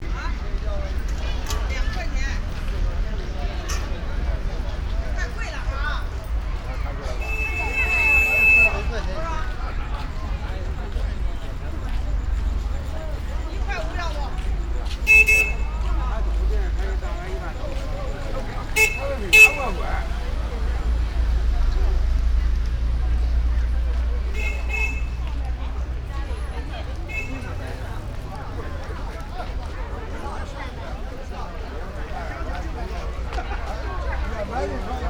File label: Beijing Street; Sound Scapes